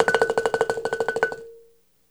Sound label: BALAFON